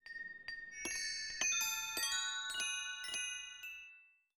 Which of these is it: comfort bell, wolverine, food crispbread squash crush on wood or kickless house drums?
comfort bell